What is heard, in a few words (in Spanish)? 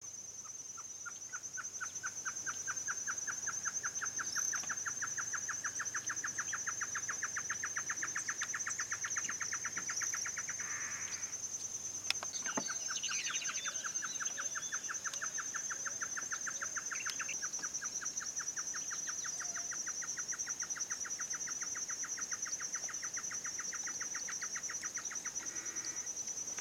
Chororó Macho y Hembra, aves; Esperanza